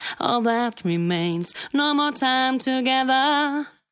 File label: sharp